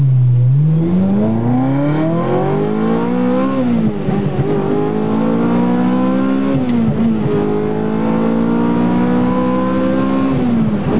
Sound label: ferrari f355; bruits de moteurs; Aston martin v8